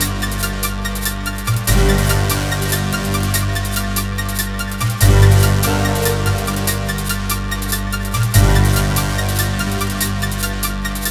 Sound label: boss loop 144bpm; chicory a colorful tale; ps4